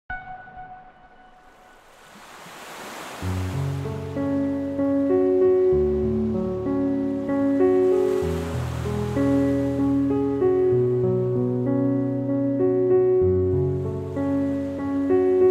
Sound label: background music